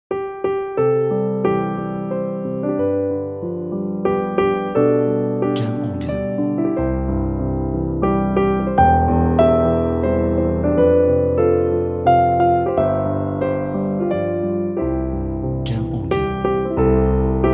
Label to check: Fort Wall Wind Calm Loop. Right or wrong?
Wrong